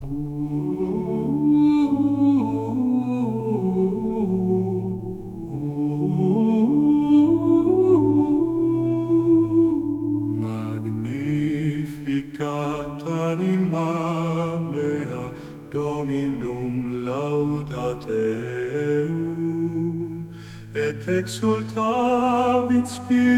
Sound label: Magnificat Male, gregorian chants